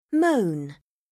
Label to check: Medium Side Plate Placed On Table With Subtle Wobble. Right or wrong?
Wrong